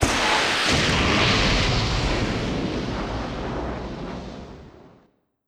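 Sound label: Booster Interior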